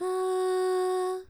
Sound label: Choir; osu